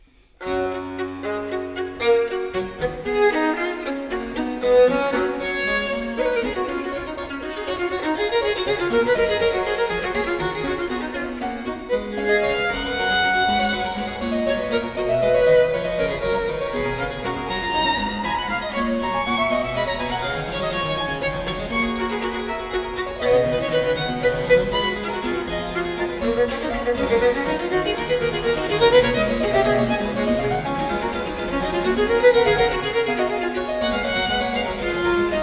Allegro, Sonatas for Violin and Harpsichord; Sonata No. 6 in G Major, BWV 1019; Bach; J.S. Bach

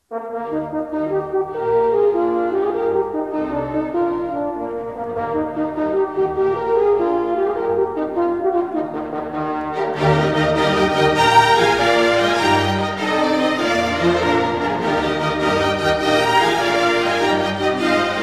Horn Concerto No.2 in E flat; Mozart Horn Concertos

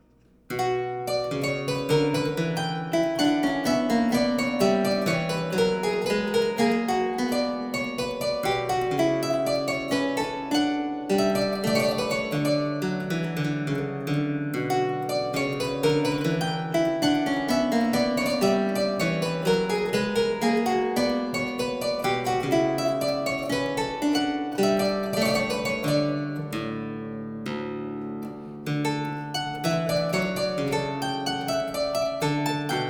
French Suite No. 2 in C minor, Menuet; Johann Sebastian Bach

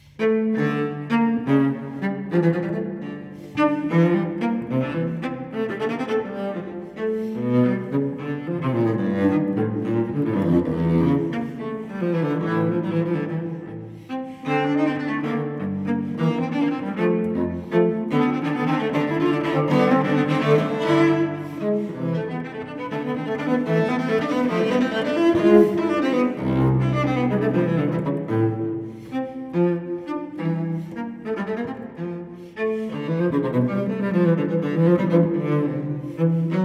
Cello Suite No. 2 in D minor Gigue; BWV1008; Johann Sebastian Bach

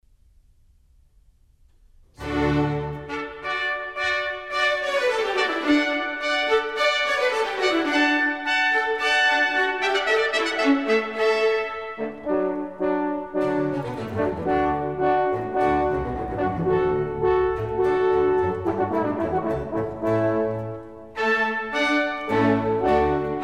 Water Music Suite

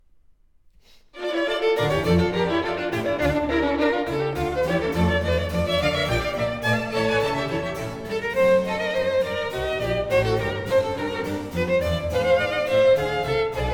Concerto for 2 Violins, Strings

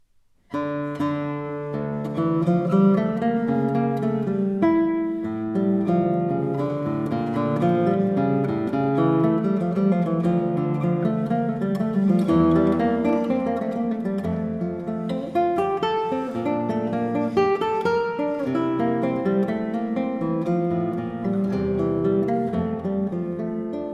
Violin Partita No 2 in D Minor, for guitar; Bach Transcriptions and Arrangements for Guitar

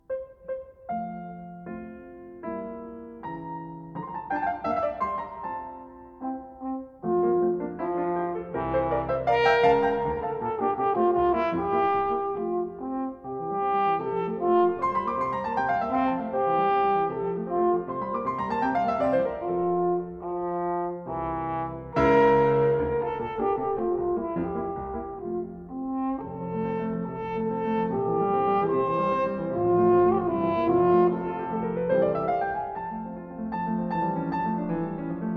Sonata for Horn and Piano in F Major, Rondo

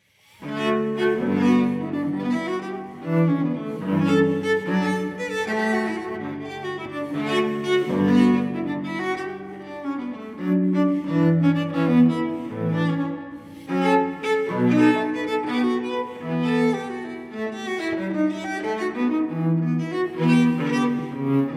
Cello Suite No. 6 in D major, Gavottes I II; Bach 6 Suites For Cello; Johann Sebastian Bach; BWV1012

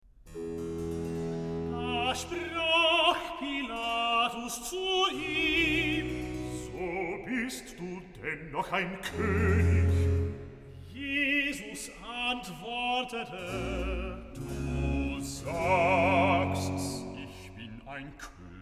Recitative; Bach, J S; Johannes-Passion, BWV 245, Pt. 2; VIII. Recitative. Da sprach Pilatus zu ihm

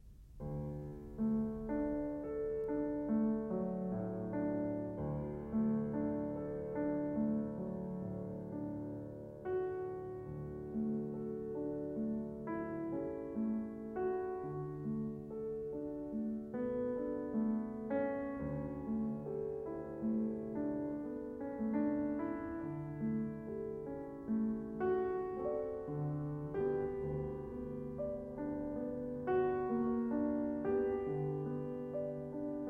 Prelude in D major, piano; 24 Preludes

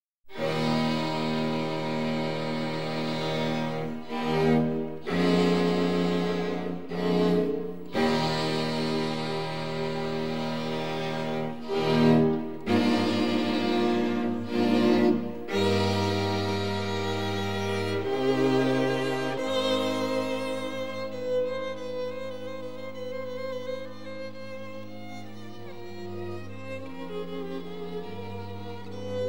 String Quartet in E flat

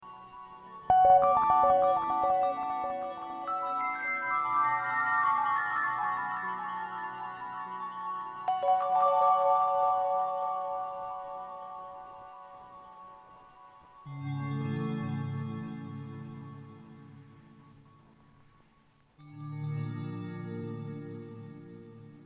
Final Bell Threshold; Sutton

